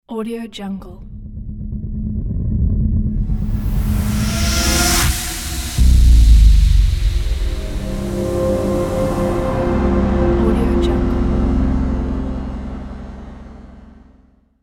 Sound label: race logo